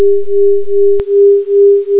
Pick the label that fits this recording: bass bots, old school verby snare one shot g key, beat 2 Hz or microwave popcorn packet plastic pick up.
beat 2 Hz